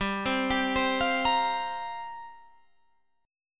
C 7th Suspended 4th G

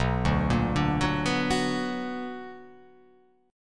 B Minor Major 7th

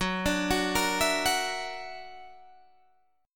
Gb Augmented 7th Gb down Guitar Open D

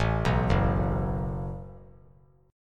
Gb Minor Double Flat 5th; arpeggiated; JGuitar

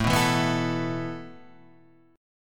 A Suspended 2nd A Guitar Standard; Asus2 strummed

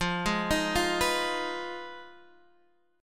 Ab Minor 6th F

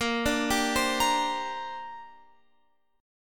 Bb 6th Add 9th Bb Guitar Open F; Bb6add9 arpeggiated

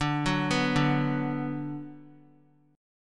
D 6th, Guitar; arpeggiated; x,5,4,4,2,x; 4E,4A,5D,5G,5C,6F; chord

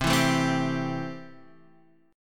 C 6th, C, down, Guitar, Standard; C6 strummed

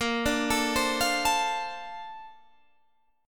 Bb Major 9th, BbM9 chord; arpeggiated; JGuitar

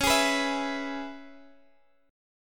E-Major C sharp; strummed; E/C#; JGuitar

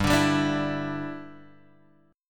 G 5th Add 13th G Guitar Standard, chord

